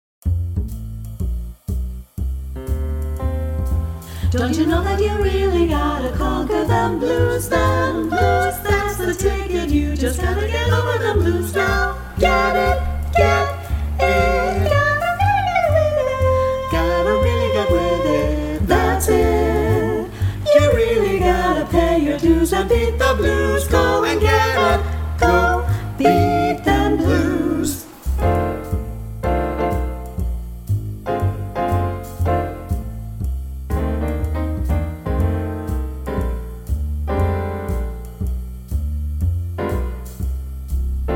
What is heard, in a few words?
Vocal Blues Etude NO TENOR